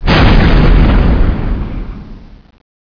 Explosion Starship; XWing

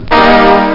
TRUMPETS

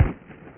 Apache Shot; shadez

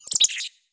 bird chickadee

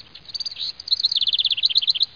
tweet

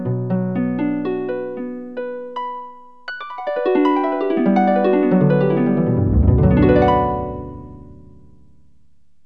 harp; Roland - An Invitation To The Roland World Of Music

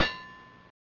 Sword clang; AMOS Music

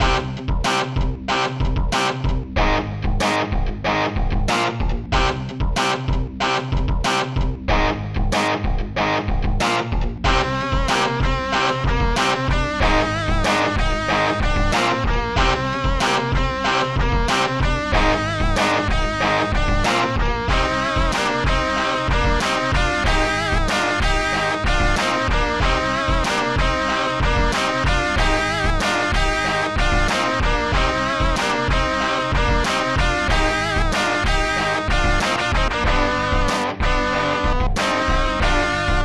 rock guitar, rock heavy; Digital Symphony Masterpieces Collection

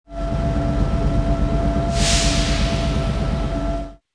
solar, buildings

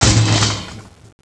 Bang; Princess and the Pea; SoftKey

